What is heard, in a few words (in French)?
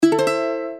guitare